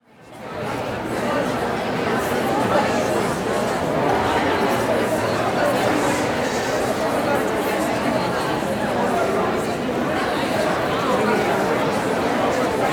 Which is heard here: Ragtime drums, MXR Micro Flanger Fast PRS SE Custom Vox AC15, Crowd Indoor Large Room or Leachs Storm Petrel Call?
Crowd Indoor Large Room